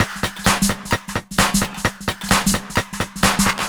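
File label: No bass drum, Drum Loops 130bpm; Zero-G; Soundclash Nu Dance Electro; Acidized Wavs; Klub 2 Death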